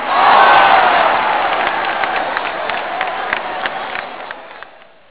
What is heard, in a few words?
tackle